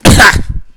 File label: cought